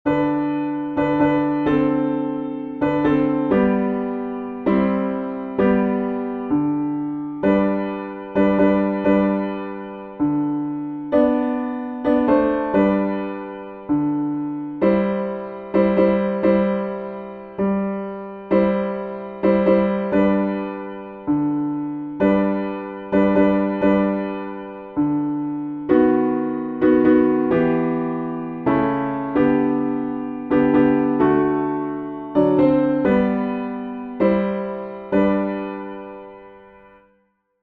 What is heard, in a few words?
piano backing; tuba; This Little Light of Mine